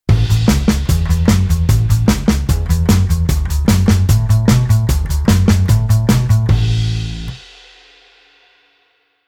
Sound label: bass rock n roll